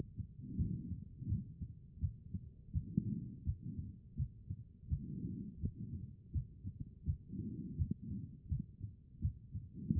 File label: HEART; B MODE